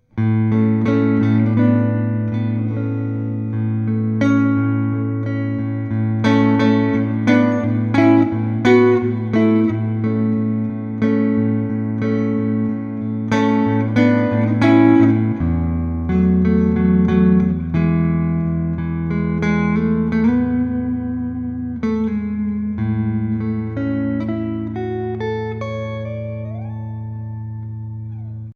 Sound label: Fender Squier Bullet Strat HSS neck clean ballad